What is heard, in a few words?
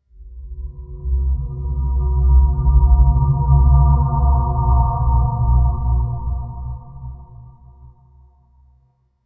opensound